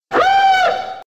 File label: alarm; 501st SWOP Al Rayak-Liberation